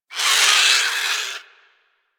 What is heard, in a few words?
Avichaea Vocal Death; Warframe; Hunting Critters